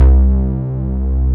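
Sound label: MATRIX, Synth Bass; SYNTH-Basses; Sound & Vision; Gigapack I CD 2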